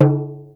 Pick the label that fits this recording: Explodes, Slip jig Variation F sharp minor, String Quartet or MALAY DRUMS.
MALAY DRUMS